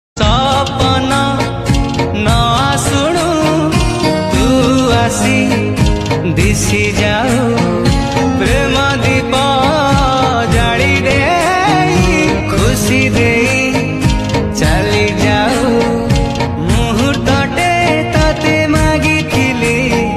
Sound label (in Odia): odia romantic songs; odia ringtone